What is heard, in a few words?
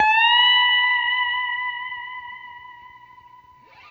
Guitar & Bass; Quantum Leap